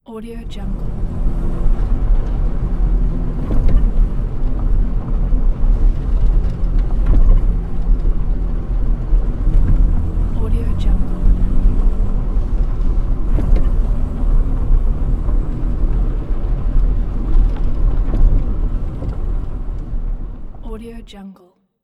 car inside

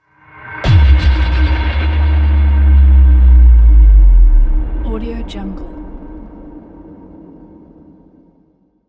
tense sub bass

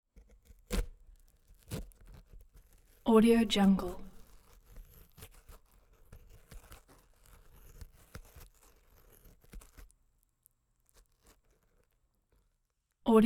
int bone and flesh; collanderson

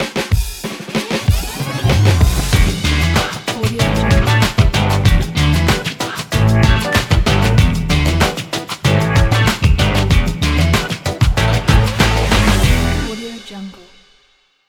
funk intro; wolf-music

